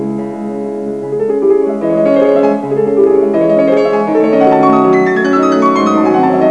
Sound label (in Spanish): piano